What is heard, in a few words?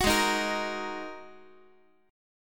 Ab 7th Suspended 2nd Ab, Guitar; Ab7sus2 strummed